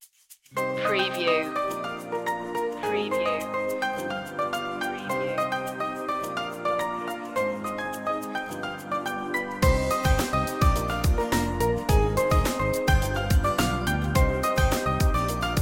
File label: latin nights